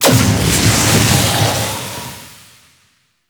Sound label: Keyglyph Activation Bleed Condition; Warframe; Entrati; Vaults